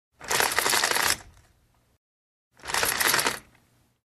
zvuk zhaliuzi

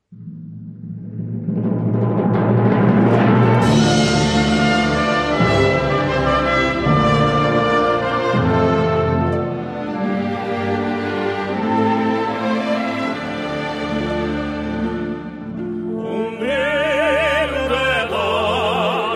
gimn buriatii

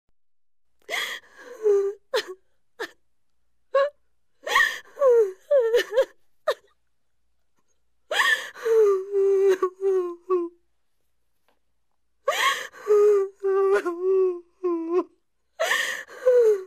plach jenshiny

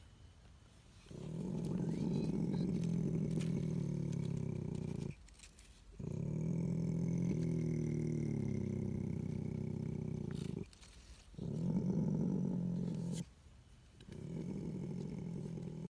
opossuma

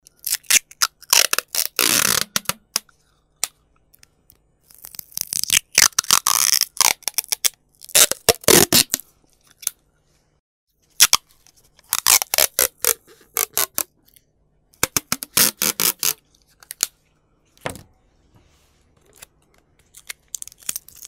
skotch zvuki

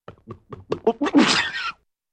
podav vodou

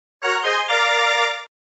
pobeda zvuk effekt

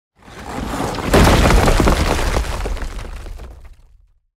tresk steny zemletr

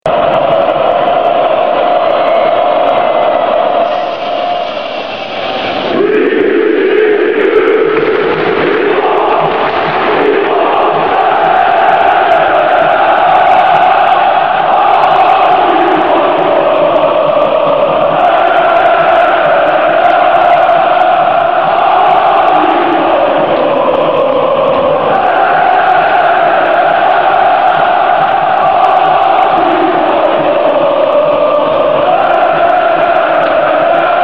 bolelshiki

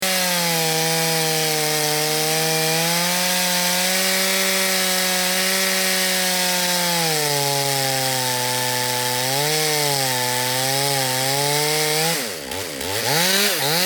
benzopila derevo

